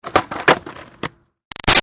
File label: Skateboard Olly Standing; Skateboarding; Sports; Big Room Sound